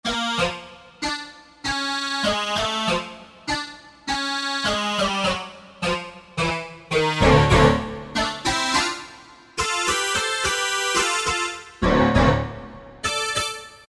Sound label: Wire, Korg M1; Factory preset examples